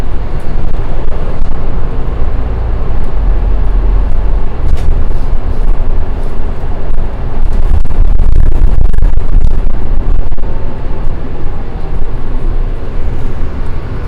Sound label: Inside Bus, Binaural, Background Noise; SymmetricArray